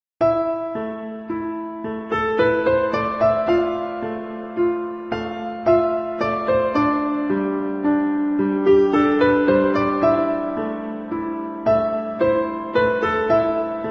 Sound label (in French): calme